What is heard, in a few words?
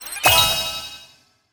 tower safe